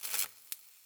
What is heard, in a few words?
light gas spark; Escape from Tarkov